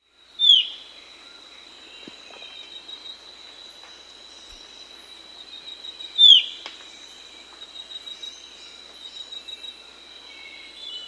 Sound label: Ochre-Breasted Antpitta, S. America